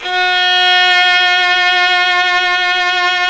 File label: Synclavier Strings; ILIO